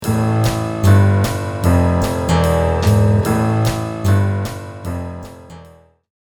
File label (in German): Klavier